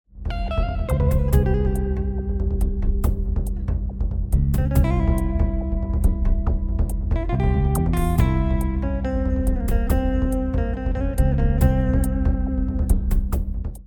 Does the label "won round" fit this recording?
Yes